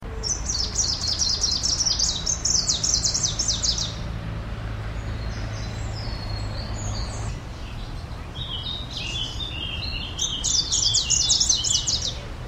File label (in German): gruenlaubsaenger